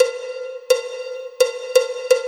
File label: Cowbell Intro